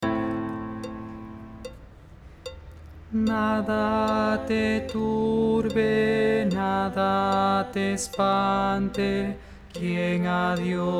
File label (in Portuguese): tenor